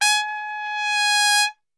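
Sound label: G#3 trumpet, Brass Super Section; Best Service; TRP SWL; 90 s Sample CDs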